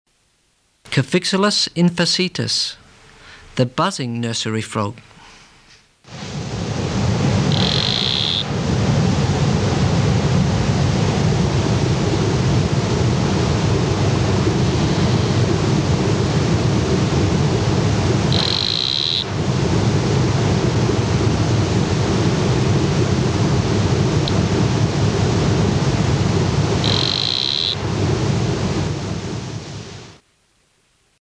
Cophixalus infacetus

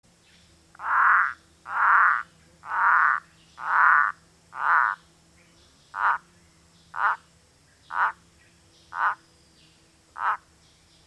Limnonectes hascheanus

